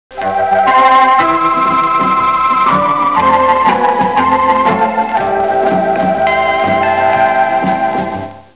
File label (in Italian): suonerie musica hawaiian